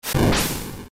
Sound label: fire; ff3; ff3us